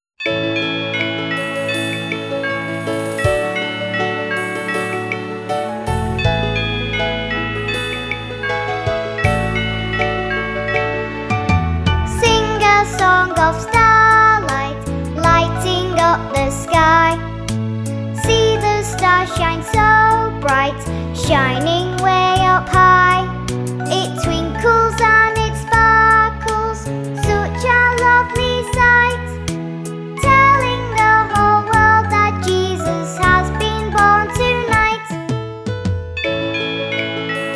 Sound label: sing a song of starlight vocals